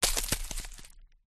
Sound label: rock small roll across dried leaves dirt; foley